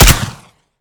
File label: shotgun, supressor; tacz; daffas arsenal